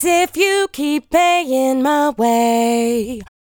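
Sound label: 128, G minor, Lead Female Vocal Dry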